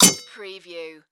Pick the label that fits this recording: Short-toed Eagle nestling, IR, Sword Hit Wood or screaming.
Sword Hit Wood